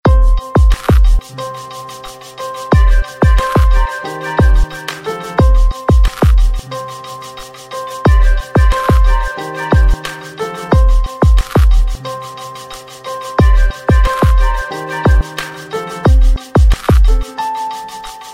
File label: iphone 4g ringtone